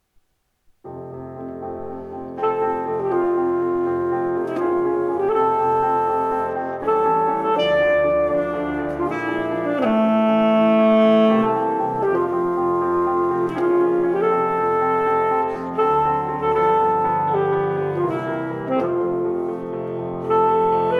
andante, Bel Canto

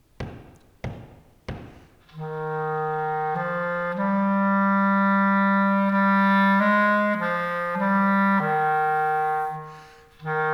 Monday Minuet, Skilful Duets

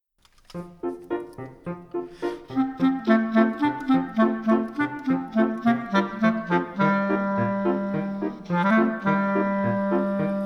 Skilful solo; Skilful Solos

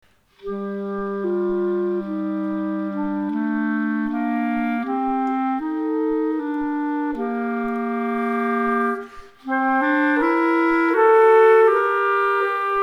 Duet, Skilful Duets

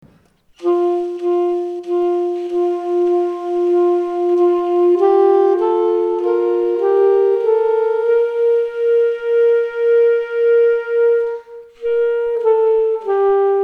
Duet

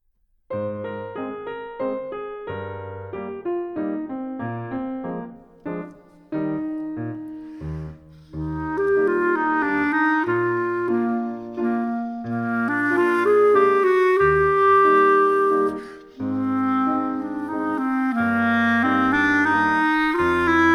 Skilful solo; Skilful Solos

